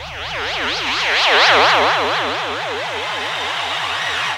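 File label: SYN left, Production Effects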